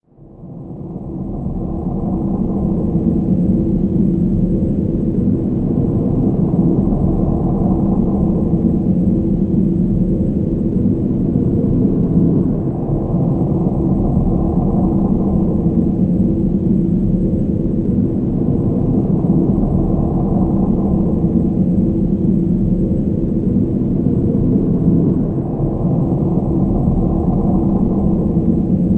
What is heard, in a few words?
Tutorial Ambience; Awesomenauts